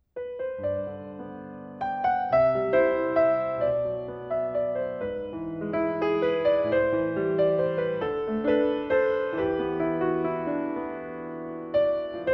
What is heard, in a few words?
Chanson, piano